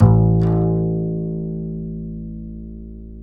A 2, Bass; Spectrasonics; Bass Legends

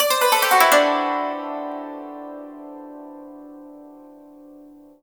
YANG CHING, Heart of Asia; Spectrasonics

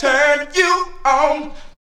VOCALS; Ueberschall; Jam Box

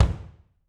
BD, Drums; Bob Clearmountain Drums I

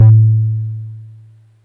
D.B. One Shot Bb; Synth Bass; standard 2 8va

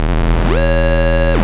Monotron Sci-Fi

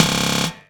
Percussions

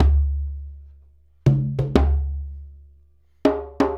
Djembe and Conga

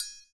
CYM, Ethno Techno; ILIO - Ethno Techno

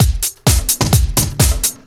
Straight Loop 128 BPM, House

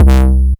Basses Root C; Vengeance - Essential Clubsounds Vol.4; Synth Shots and Basses; Note C

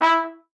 E4, TRUMPET; Best Service ProSamples vol.42 - Session Instruments; PS-42 Session Instruments

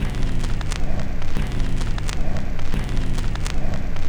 Crackle Loop, Vinyl Noise; noise hiss crackle

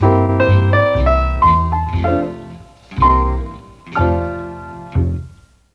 G1, Mellotron; Mike Pinder - Mellotron Archive CD

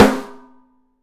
OPEN PICOLO, 3D Drums

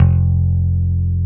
BASSES, Roland

